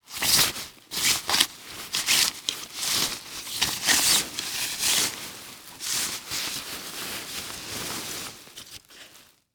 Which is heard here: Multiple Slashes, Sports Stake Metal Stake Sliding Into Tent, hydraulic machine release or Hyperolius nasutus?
Sports Stake Metal Stake Sliding Into Tent